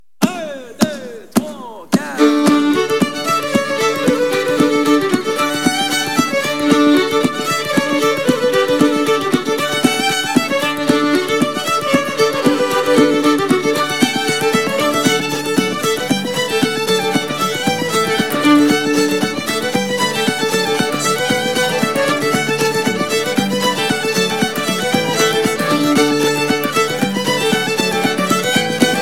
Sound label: celtic fiddle fun